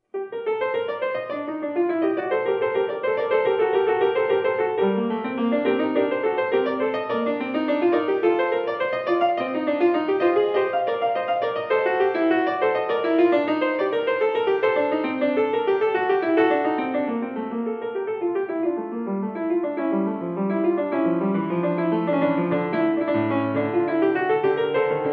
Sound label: Keyboard Suite; Herry Purcell